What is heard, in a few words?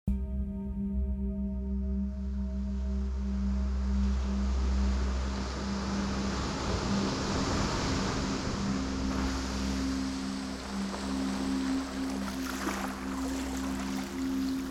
Ocean Waves; Theta Music